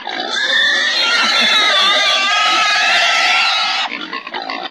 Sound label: pig; Animals